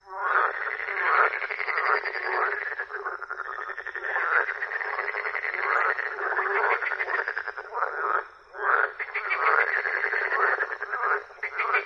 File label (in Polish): żabi rechot